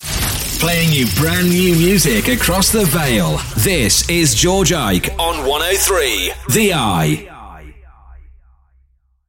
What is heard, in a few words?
brand new music